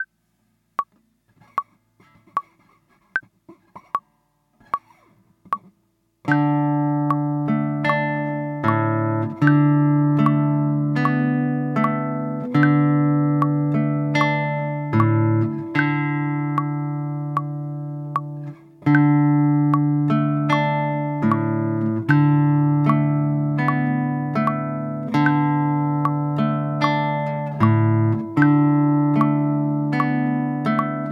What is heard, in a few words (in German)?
Samba für zwei Gitarren 2 Gitarre